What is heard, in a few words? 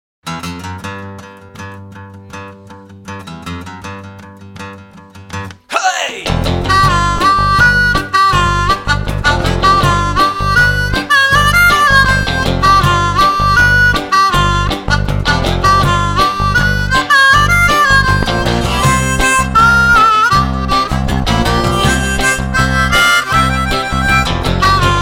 Blues Harp Blues